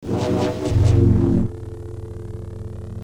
Double Bladed Saber Sound; FXHome

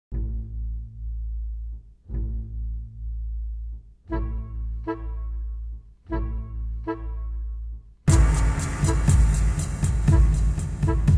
Unclassified Electronic

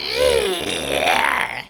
Zombie Brat Groan; Glitchedtones; Zombie Subject C

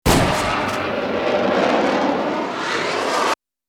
Bullet Time; FXHome; Bullet and Gun

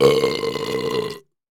Burp Long; Soundopolis; Burps and Farts; Fienup

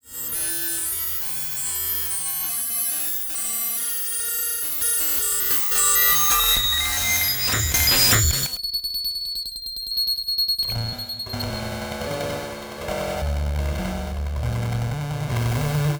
Computer Data Glitch; Sonic Bat; Sci-Fi Interface Construction Kit Vol. I

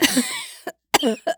voice female cough; Gamemaster Audio; Human Vocalizations

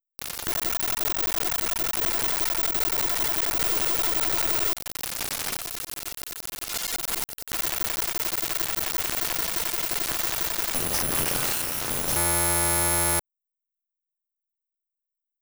Cursors, Data Moshing Raw; 99Sounds; Mangling Audio

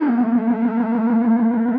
Feed chorused, Feedback FX; 99Sounds; Dark Engine

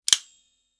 multi plier close, Tools

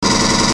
M41A Pulse Rifle; FXHome; Bullet and Gun

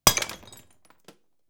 MUG Tile Floor Drop and Break; Griffin Sound Design; Mugs

